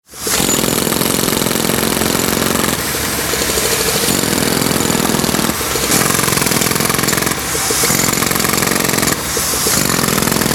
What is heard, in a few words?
Construction